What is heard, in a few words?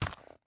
paper grab; teardown ps5 windows xbox series xs gamerip 2022